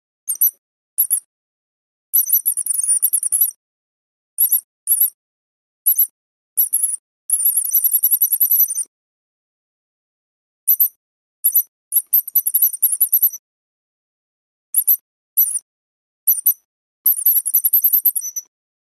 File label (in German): Mauspfeifchen